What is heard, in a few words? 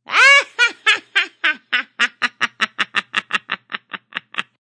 Witch Cackle; Evil Laughs; Horror